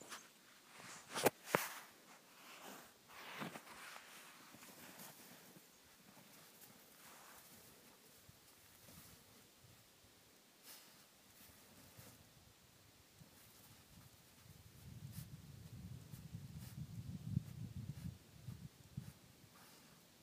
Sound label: Sniffy Puppy